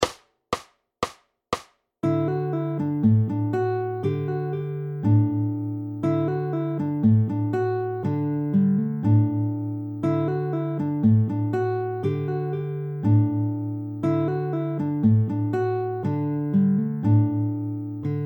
Travis fast, fingerpicking

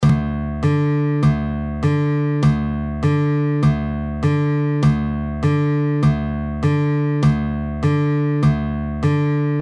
Drop D, fingerpicking

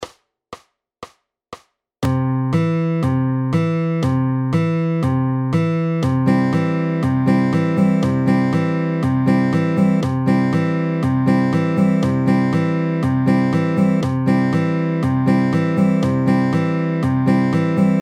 FAST, fingerpicking

